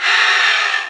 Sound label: alligator die